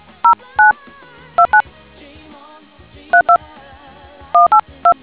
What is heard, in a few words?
dtmf